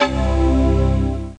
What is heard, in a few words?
Macintosh Quadra Startup, chimes